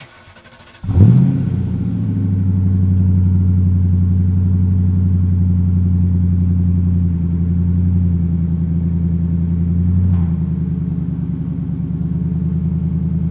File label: 99 Mustang Start Idle Off, Automobiles